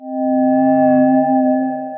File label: Reso, Ambient & Evolving; Reso Repair